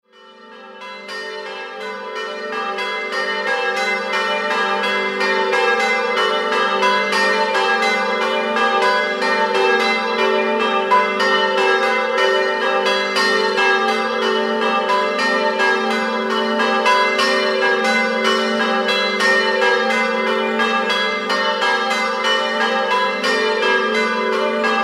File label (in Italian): CARNIA, campane